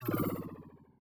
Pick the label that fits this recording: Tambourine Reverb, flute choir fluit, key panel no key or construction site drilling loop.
key panel no key